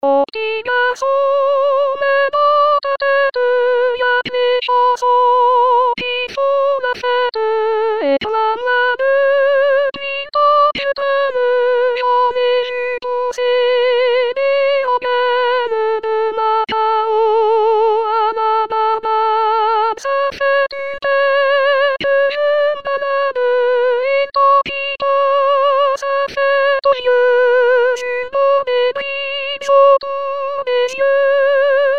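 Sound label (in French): mon p'tit garçon refrain sopranos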